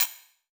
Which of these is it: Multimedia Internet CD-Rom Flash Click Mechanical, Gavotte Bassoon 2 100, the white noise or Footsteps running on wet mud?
Multimedia Internet CD-Rom Flash Click Mechanical